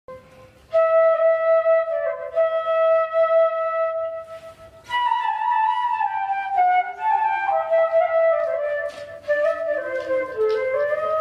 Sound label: flute sad flute ringtone